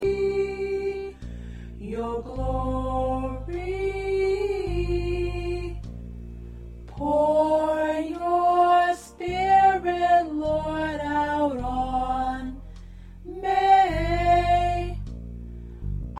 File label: P Soprano